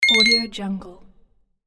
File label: interface accept bells short; interface sounds